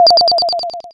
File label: Cry